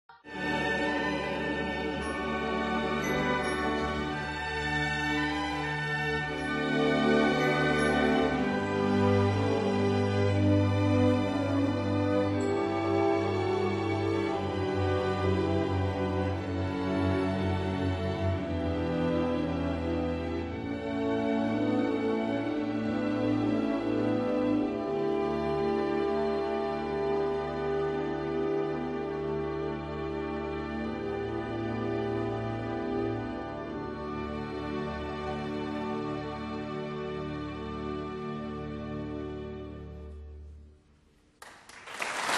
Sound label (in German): Orchesterausklang